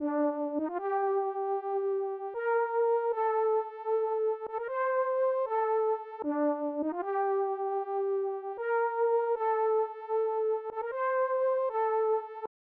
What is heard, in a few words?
154 BPM G Min Lead; Trap; Loop Stems; Cymatics; Bullet; 2022 Melody Collection